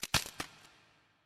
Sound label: crack; hex-a-hop